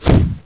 zomb lnch

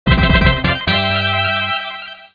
FAN

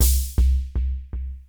PERCUSSN AMBNT 160, Ambient, Drum; eJay

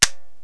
load

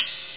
tsing, cymbals

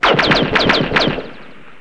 explosion; WEST DEMO

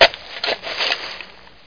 shovel

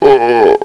knockback; Toxic Ravine v1.1; The Arcade

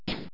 SWITCH

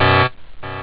synth, rave

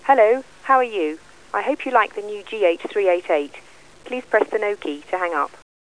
Incoming

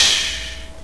Tssh; System's Twilight

